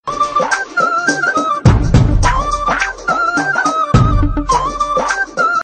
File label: Flute Tune